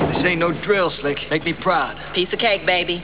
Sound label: nodrill